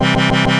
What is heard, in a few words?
organ; Goldwave